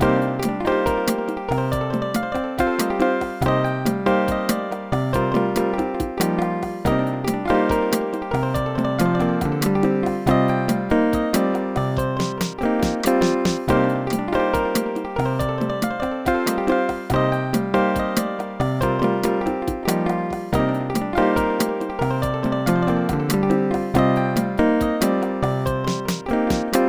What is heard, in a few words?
solo; Dr. T's MIDI Recording Studio